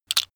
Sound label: tiles wood; objekte; holz